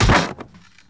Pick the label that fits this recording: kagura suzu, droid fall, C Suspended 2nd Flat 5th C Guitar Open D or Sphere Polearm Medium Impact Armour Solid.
droid fall